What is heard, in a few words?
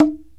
PERC KALIMBA